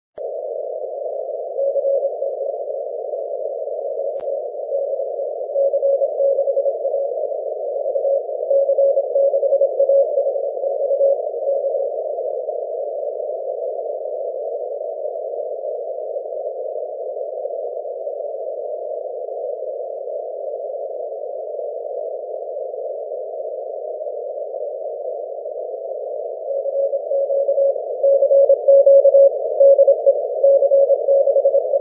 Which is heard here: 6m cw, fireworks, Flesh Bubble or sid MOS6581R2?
6m cw